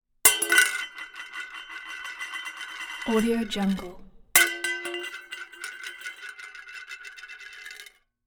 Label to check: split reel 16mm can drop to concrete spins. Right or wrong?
Right